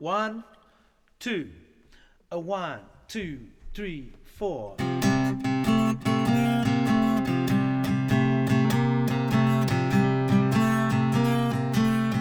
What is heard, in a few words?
Guitar Playback